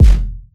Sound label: tight low end kick drum f sharp key; kick drum samples